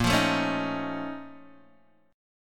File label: A sharp Minor Major 11th, A sharp, down, Guitar, DADGAD